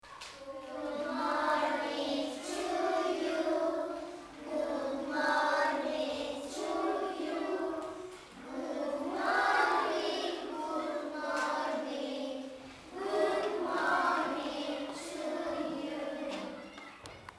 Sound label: Good morning